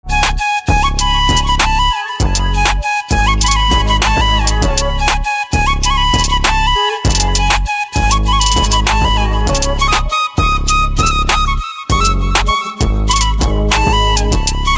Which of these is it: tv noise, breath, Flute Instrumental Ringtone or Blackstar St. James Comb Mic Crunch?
Flute Instrumental Ringtone